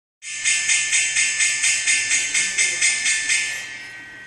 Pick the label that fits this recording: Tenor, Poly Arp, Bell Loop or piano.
Bell Loop